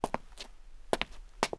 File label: krok soup; Mafie 1